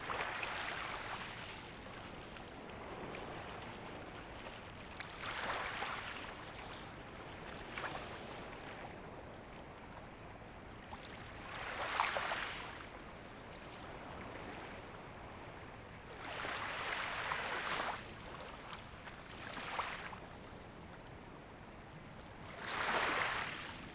vaguelette sable prox

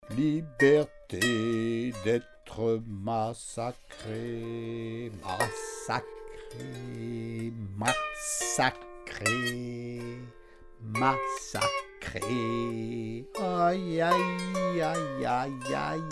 chant liberte massacr

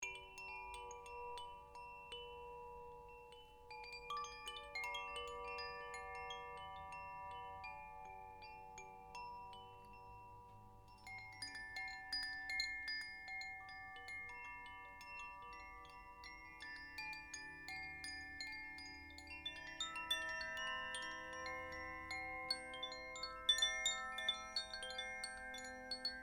clochettes

